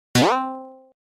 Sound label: spring bounce; sonic